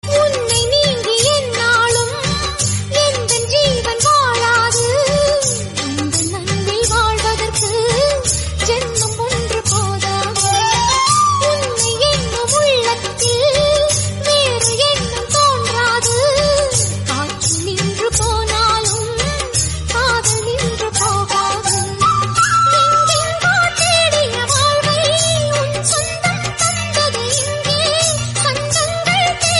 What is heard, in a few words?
Female Version Tamil Song